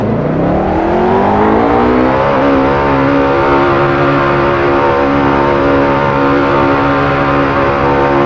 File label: rev, vehicles cars; lwcars